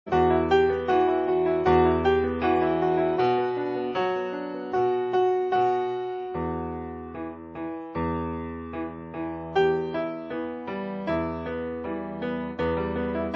grand piano; Spelunker

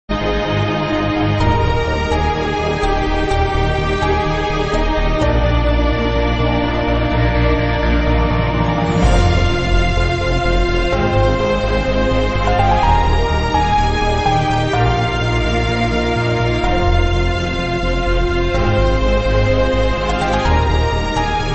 Orchestral; Last Ninja 2; Central Park

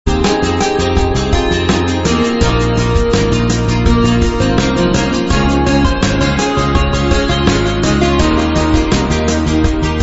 Guitar rmx

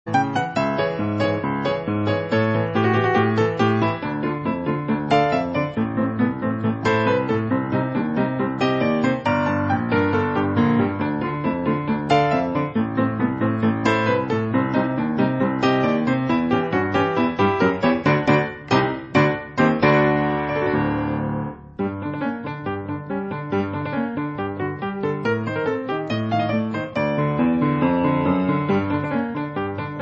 Piano; Monty on the Run

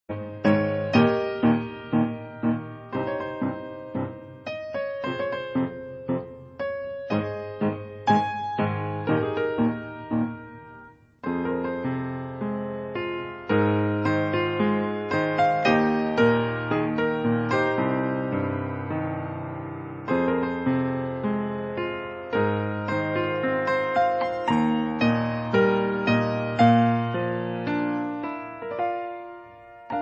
Piano-Suite; Dragon's Lair II

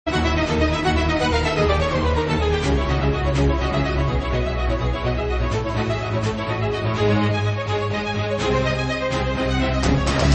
orchestral rock mix; Mission A.D. gameplay theme

